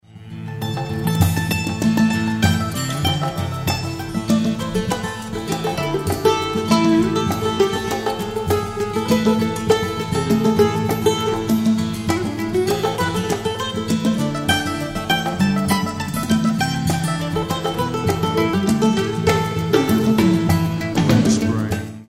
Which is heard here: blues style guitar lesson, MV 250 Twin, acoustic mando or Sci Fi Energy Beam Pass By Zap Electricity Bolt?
acoustic mando